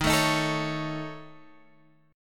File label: Db Suspended 2nd Sharp 5th